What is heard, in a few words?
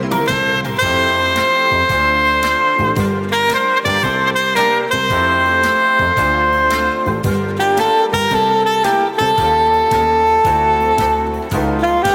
alto, sax